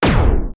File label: Blast, Weapon